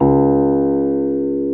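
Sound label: piano C#2, soft piano; AKAI S-Series CD-ROM Sound Library VOL-2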